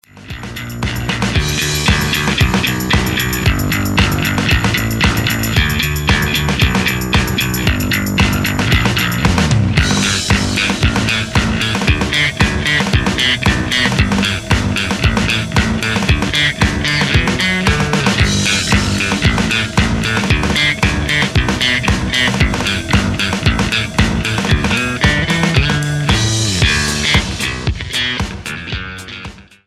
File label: bass a2